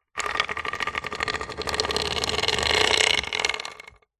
industrial chain small pull over thin wood hoist medieval